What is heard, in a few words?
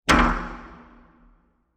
Large Wood Impact; Miscellaneous Impact; Impacts